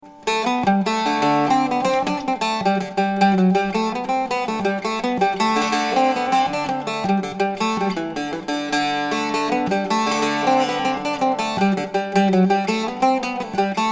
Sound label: woodscaolrua, octave mandolin